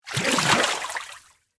Water Foot Drag, Water, Foot, Drag, Through, Water, Pool; Nature; BlastWave